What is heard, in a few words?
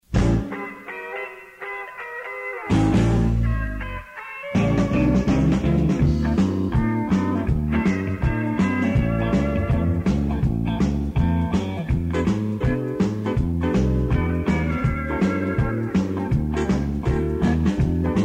country